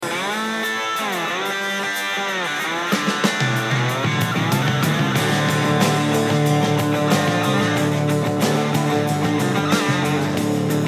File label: 120Hz HPF